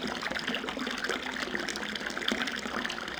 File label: water trick; Soldier Of Fortune II Double Helix; XBOX; ambience